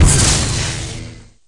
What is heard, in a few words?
shock; minecraft; technic